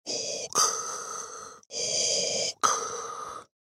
Cartoon Sci Fi Breathing Male Loud Heavy Sci-Fi; Cartoon Sound Design; Blastwave

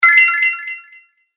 Magic Tokens Game Fx; Video Game Sound Effects

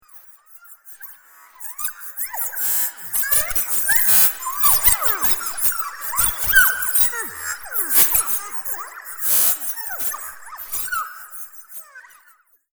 Alien Robot Communication; Robot Sound Effects; science fiction sound effect; star wars / trek style games

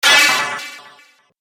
Laser Shatter; Laser Sound Sound Effects

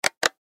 Button Switch

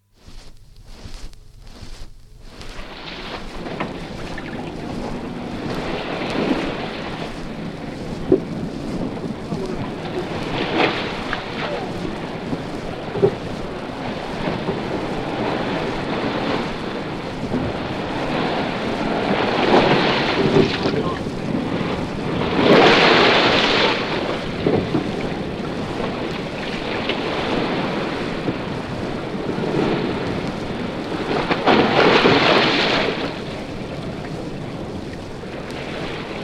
Choppy sea from trawler deck; from 78 disc; Ocean; BBC-Historical; Ocean (Sound Effects:Nature)

